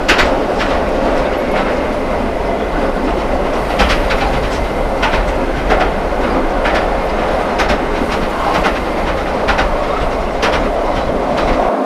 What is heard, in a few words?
Run, Train; OpenBVE